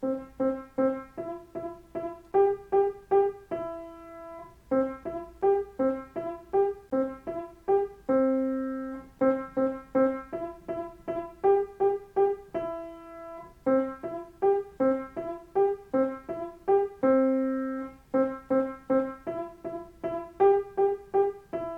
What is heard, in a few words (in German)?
Kanon PLAYALONG